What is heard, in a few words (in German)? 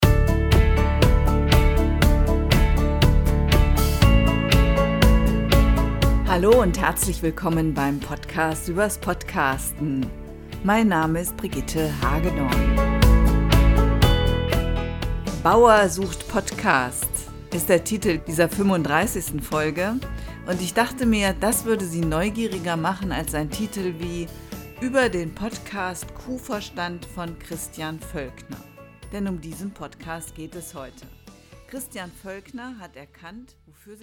intro einstieg mit musik unterlegt